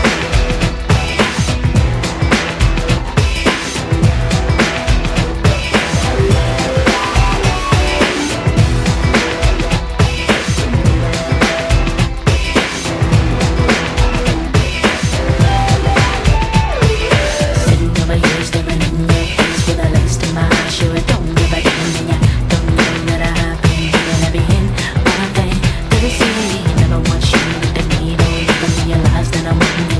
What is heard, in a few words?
pop